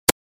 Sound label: hit